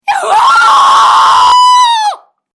Scream Human Female; Screaming Sound Effects

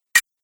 Metal A Click Sound

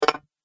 Robot Turn Off Switch; Robot Sound Effects; Sci-Fi Robot Sfx

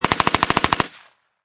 Machine Gun Uzi Model A Long Burst; Machine gun: UZI Model A. Long burst. Version 2.

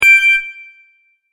Tap Play, button; multimedia

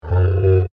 Dinosaur Bleat, Creature; Animal; Dinosaurs; Animals; BlastWave

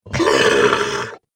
Scream Demon; Monster Sound Effects; horror

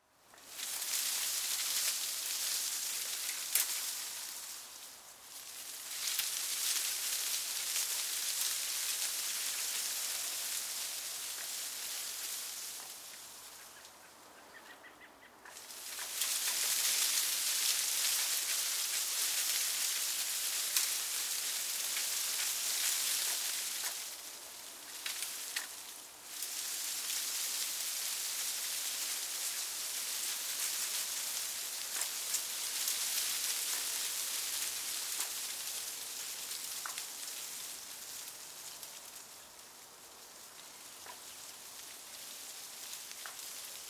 Leaves Small Leaf Wind Moves Rustles Snaps; Weather

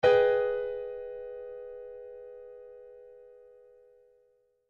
Piano Chord, Piano Chord G Min Maj 7Th; Pianos; Blastwave

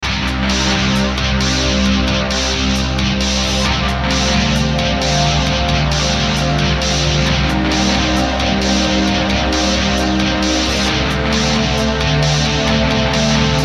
Beat Rock Strings Distortion Guitar; Miscellaneous Loops

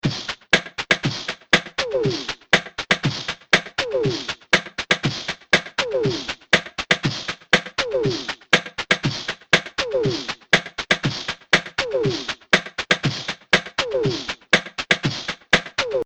120 Bpm Beatbox Loop, Vocal Loops; Percussive And Rhythmic; Seamless loop

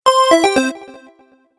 Stage Level Complete, Video Game Sound Effects

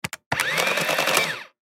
Printing Calculator Press Button Print Paper; Printers; Office; Blastwave

